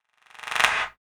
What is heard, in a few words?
CLAP FX